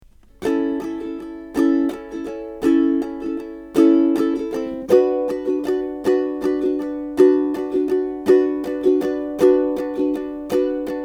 Strum, ukulele

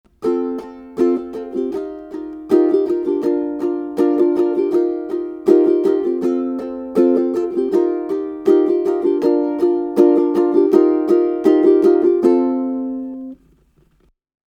Strum, ukulele

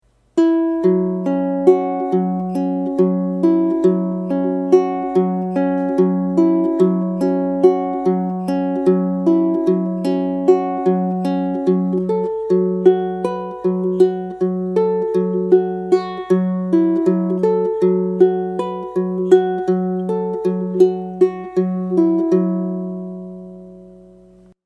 Riff Slow, ukulele

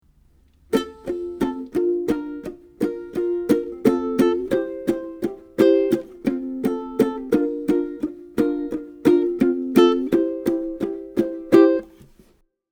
Chugs, ukulele

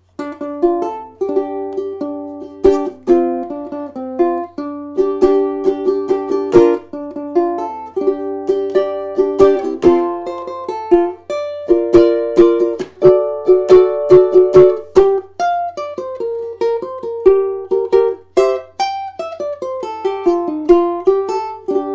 hawaii easy, ukulele

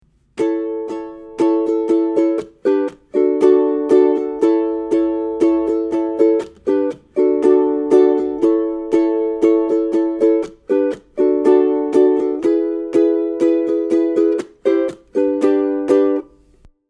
Verse Strum, ukulele

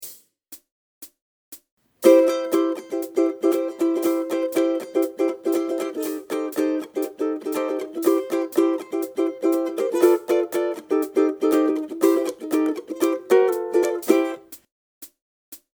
Strum, ukulele

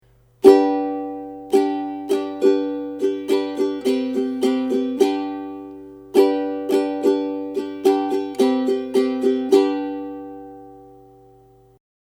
Strum, ukulele

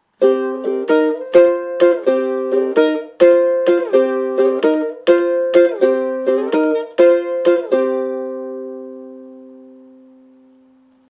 ukulele

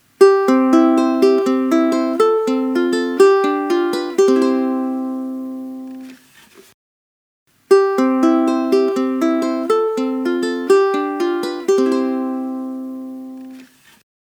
Tremolo, ukulele

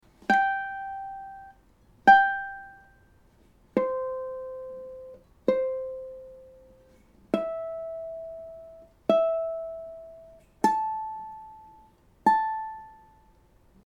anuenue intonation, ukulele

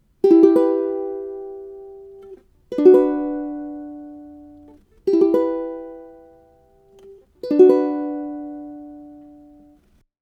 Solo, ukulele

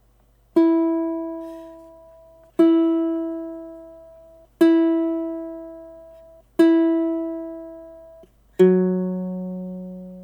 tuning, ukulele

